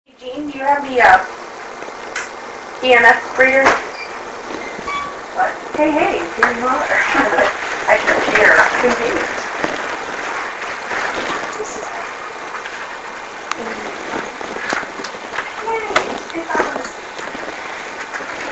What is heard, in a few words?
Giggling